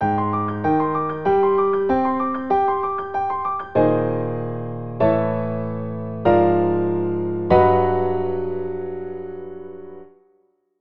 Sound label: Piano; The Legend of Zelda Ocarina of Time